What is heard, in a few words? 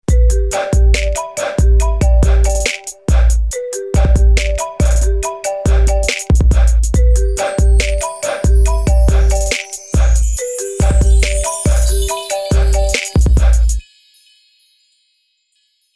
iphone marimba ringtone trap remix